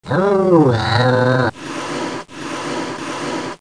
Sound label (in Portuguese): peido